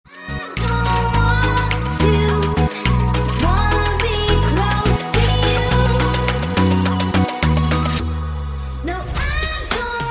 slow remix

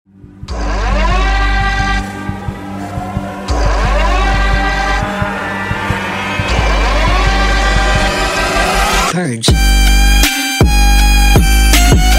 halloween trap remix; purge

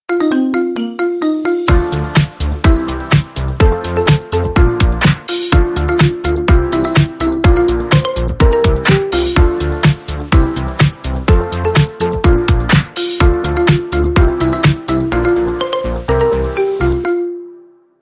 marimba remix

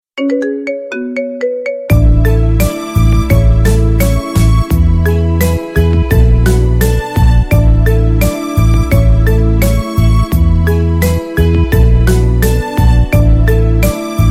marimba remix

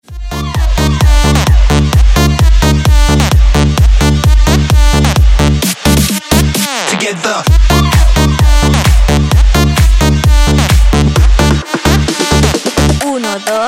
drop the beat

